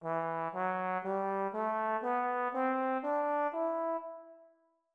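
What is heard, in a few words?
natural minor E3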